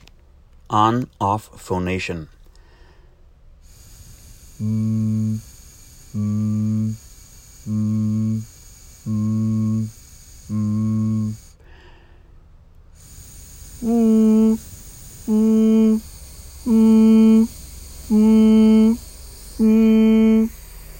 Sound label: On Off Phonation